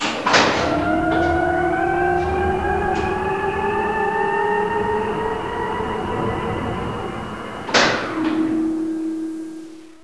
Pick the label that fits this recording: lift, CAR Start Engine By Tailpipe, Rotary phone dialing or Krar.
lift